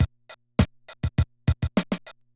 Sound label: Loop, classic machines, rpm 40